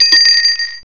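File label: barrel drop; Digital Paintball 2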